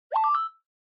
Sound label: Smartphone UI Sound Speaker